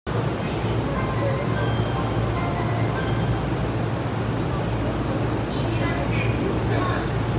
JR埼京線与野本町南, train sounds

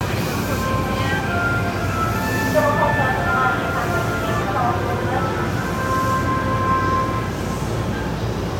京浜東北線日暮里南, train sounds

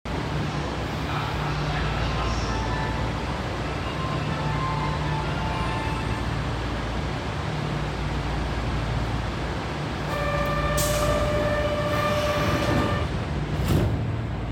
京都市営地下鉄東西線発車音西

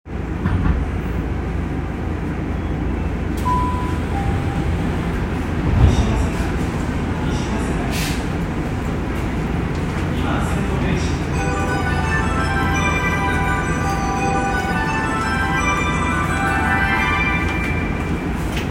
副都心線西早稲田北

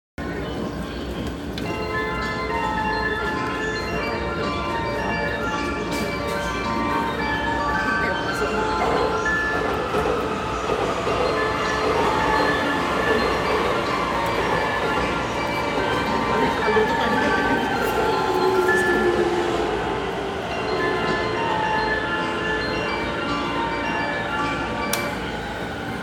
宇都宮線 赤羽 南, train sounds

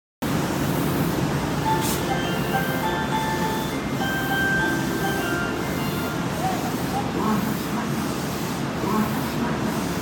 発車, train sounds; 玉造

